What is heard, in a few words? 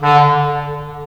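wind clarinet; Roland - LA Composer vol. 3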